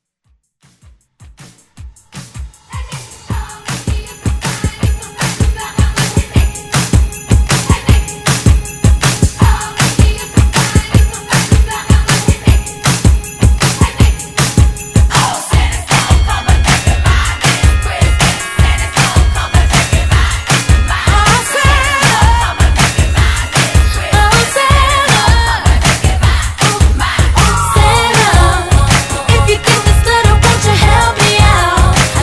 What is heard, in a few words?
Xmas Redrum